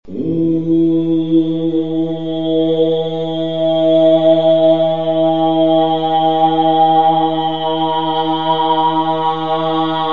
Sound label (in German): Mundraum steigend, Didgeridoo